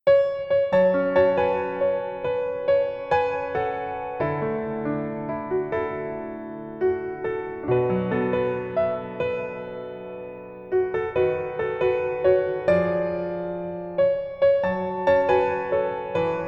piano arrange ver

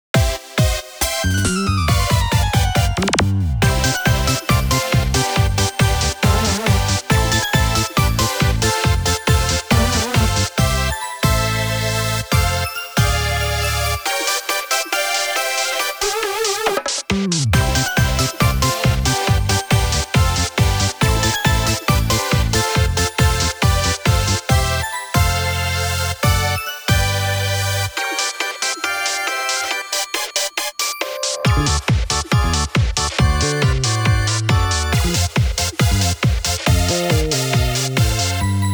Comical Magical

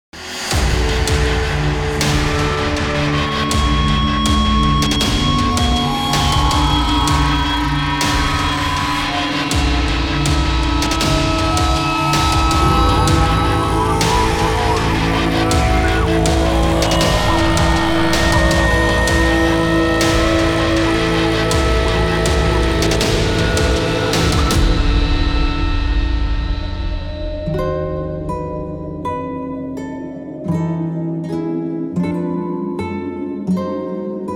Drone

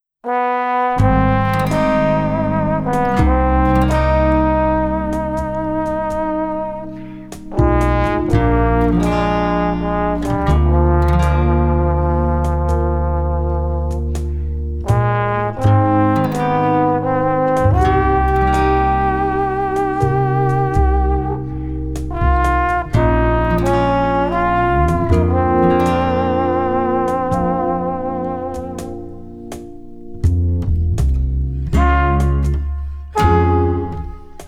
Trombone Ver.; Shingeki no Kyojin

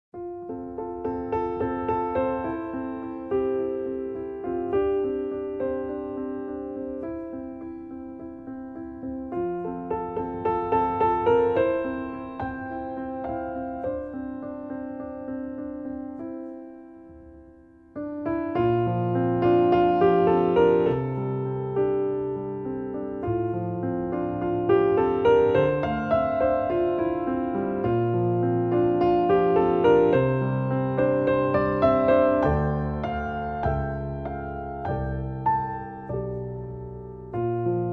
Piano Solo

